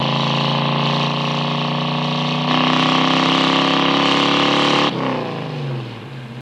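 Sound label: Mustang Accel Short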